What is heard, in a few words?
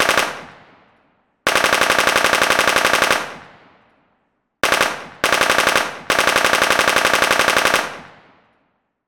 gunshots machine gun burst; sound effects one